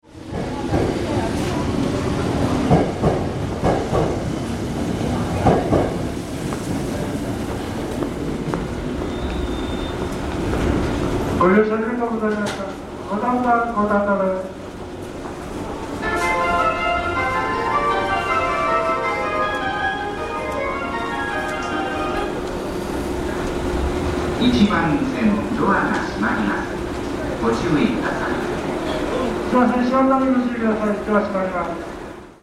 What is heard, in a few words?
gotanda Eki Platform; JAPAN